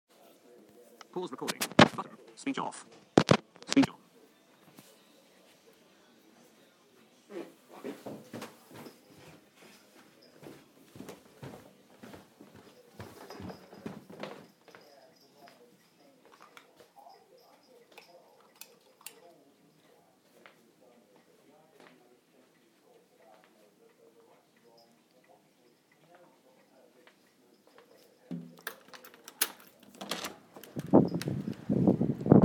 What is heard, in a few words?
an evening soundscape